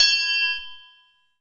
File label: Metal Hit, Drums - Percussion